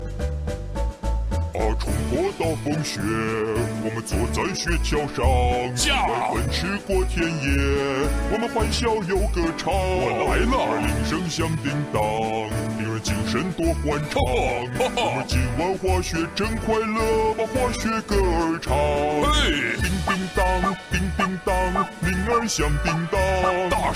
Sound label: Sounds of Christmas Bells